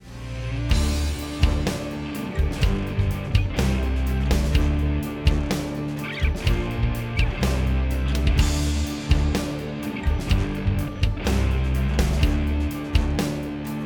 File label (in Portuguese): contralto